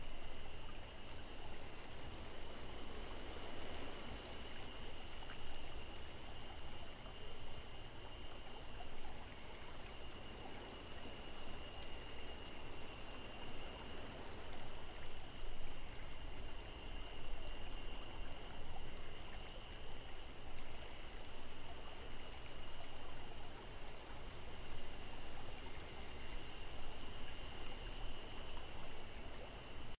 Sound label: outdoor riverside